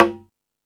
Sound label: Latin Drums, E-MU Pro-Cussion